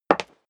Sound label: step high heels wood parquet single left; schritte